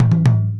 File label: JUNJUNBA HIT; Best Service; World Colours